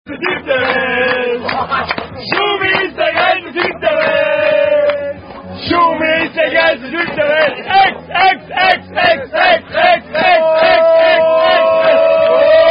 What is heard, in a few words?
German Down-Down Drinking Song; Der Schumi; Unknown German Down-Down Drinking Song